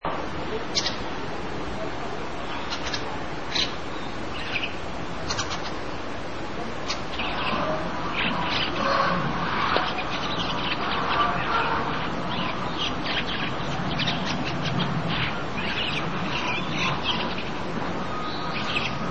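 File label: grey starling; ムクドリ; 春も秋も、群れで田畑を飛び回っています。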